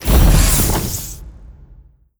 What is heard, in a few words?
move backup, dog; npc; scripted